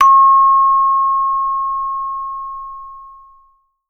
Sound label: hand chimes; Voice Crystal - Steve Reid Definitive Percussion